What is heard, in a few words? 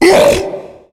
tank pain, zombie; Left 4 Fortress